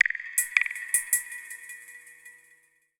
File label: PERCUSSN, Ambient, Drum